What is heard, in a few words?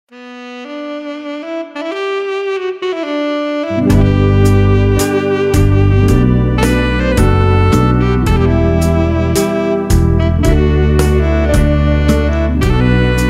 BGM Country